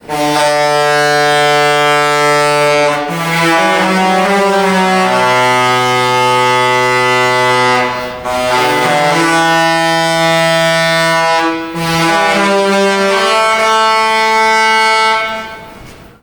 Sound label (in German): Schiffshorn Love Boat Melodie; kreuzfahrtschiff; regal princess